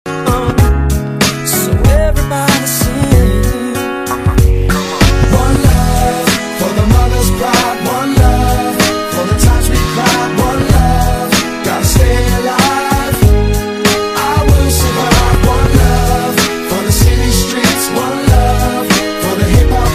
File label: love ringtone music